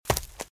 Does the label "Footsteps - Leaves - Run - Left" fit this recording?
Yes